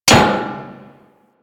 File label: Ship Hit Effects